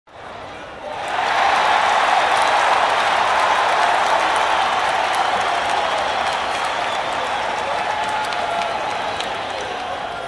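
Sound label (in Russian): звук ликующей толпы болельщиков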